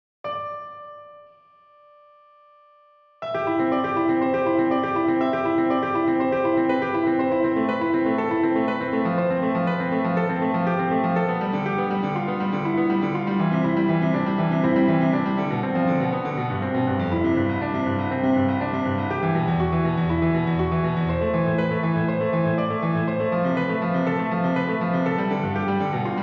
Preludes No.1 in C, piano

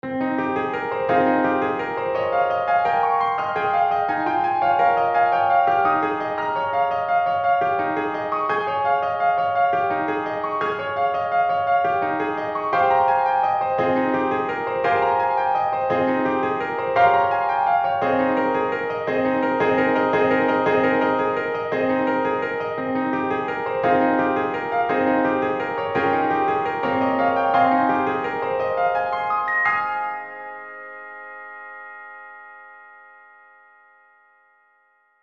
Preludes No.9 in Ab, piano

